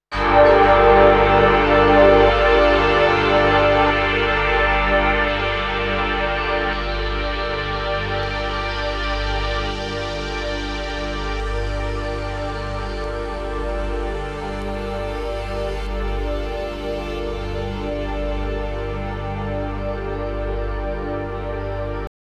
Pad 16 Steps Wet

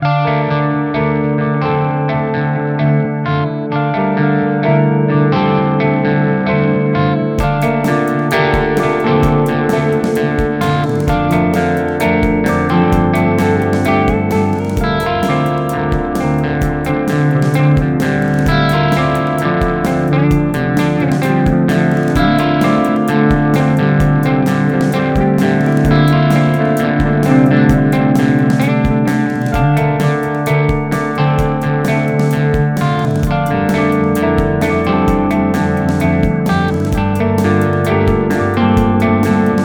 REVV G20

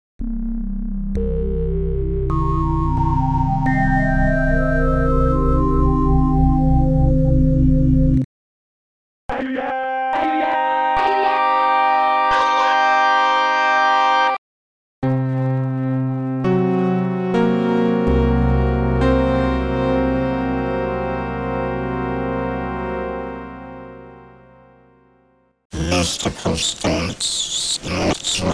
Wavetables

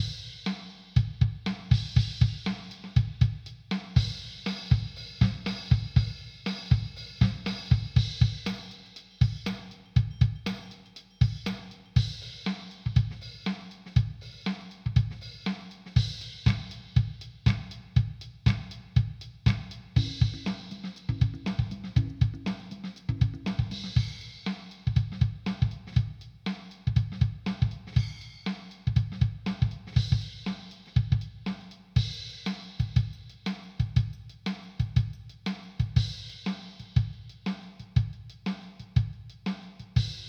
Drum Patterns; Boss RC-500

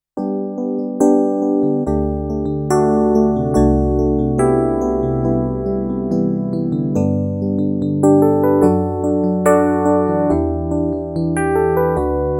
Yamaha CK88 DX E-Piano; Legend

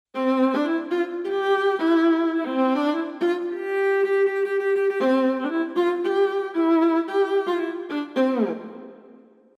Emotional Viola Czardas Sfz Ornaments

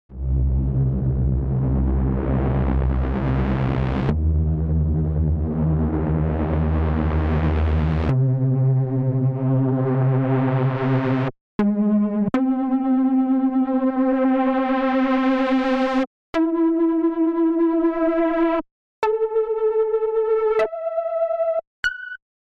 Original MS 20; Soundtoys

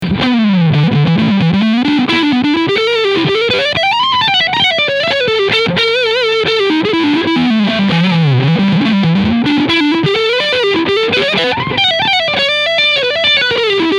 mid scoop

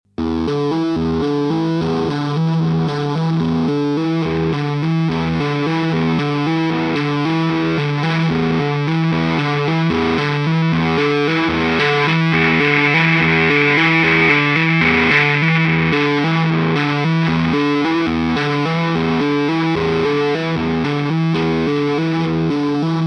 Tone Range; Korg Nu Tekt HD S

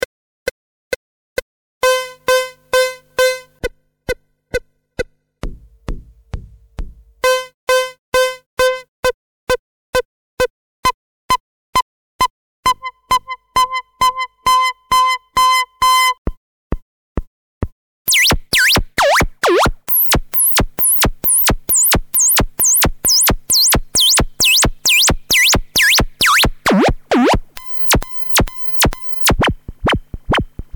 Solus ADSR

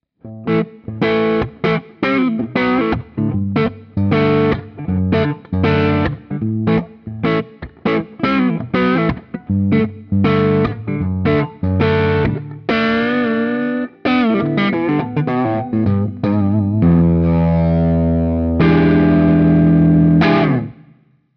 Epiphone Les Paul Neck Crunch Fingerstyle

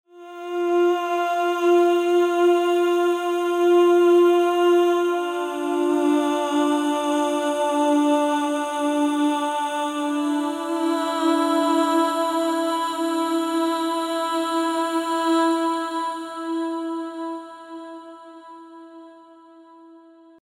Vocalise Sustained Vowels Stacked Ahh Eee Ooo

